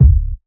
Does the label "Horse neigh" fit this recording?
No